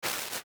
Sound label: Mouse Walk; ReplayPuzzleGame